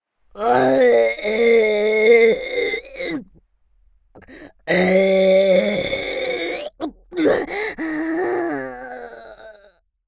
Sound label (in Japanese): 特大ゲップ二連撃; ザーメンゲップ集